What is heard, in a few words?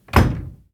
Door Close Solid; The 39 Steps